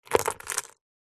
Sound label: foley gemstones several small opal amethyst jade short movement handling on surface